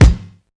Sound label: punchy kick drum single shot a sharp key